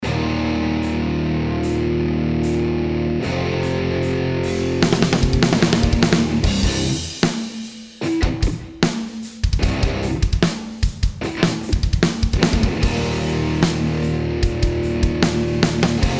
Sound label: Ludwig 5x14 Black Beauty Snare Drum Low